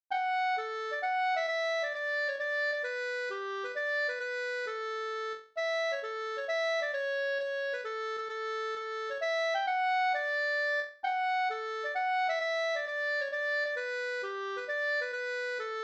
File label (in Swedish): mazurka